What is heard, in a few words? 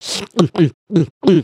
drink